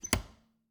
Refridgerator, old fashioned, wooden, steel, brass clasps, hinges, open; Eiravaein Works; Vaeyan IV